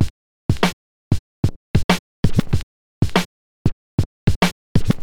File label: scratched, drum loops